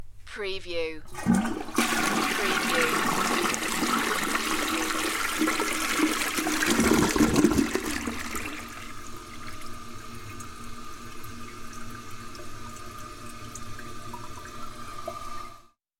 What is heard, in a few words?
Pub Toi Flsh; Household Sounds / Bathroom Sounds